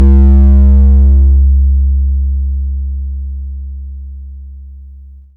tom, Korg ER-1; Kit 3

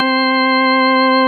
HOUSE ORG C5, DANCE ORG; Best Service; Advanced Media Trax Modern Composer

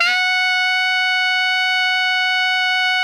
TENOR FF F#5, saxophone; Greytsounds; Philip Wolfe David Crozier's Saxophones

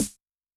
Ana Wide, Yamaha DTXpress-II snare

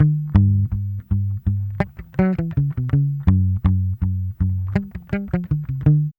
BASS, Funky Ass Loops

